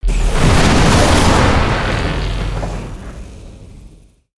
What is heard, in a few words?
Lightning Tornado; Spinjitsu